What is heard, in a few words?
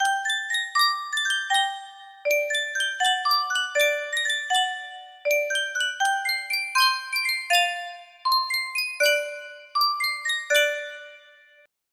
sankyo miniature music box